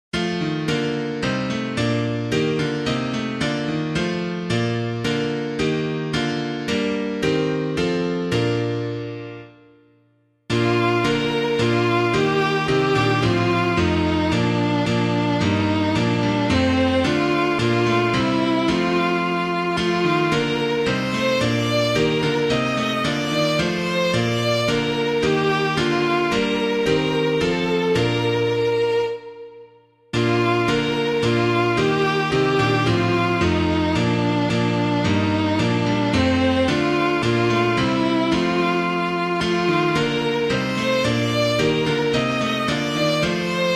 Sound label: Introit, piano